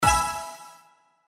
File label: Free Spin Symbol Land; slots; Valentine Blast